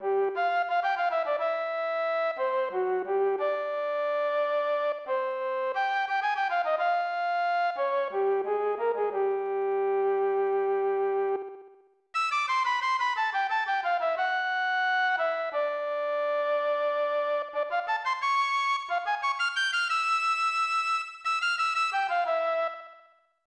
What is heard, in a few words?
Orchestra Concert; lennus 2; snes